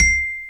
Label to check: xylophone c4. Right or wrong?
Right